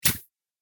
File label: Robot Swipe, sci-fi robot sound effect; Robot Sound Effects; Andrew Fleming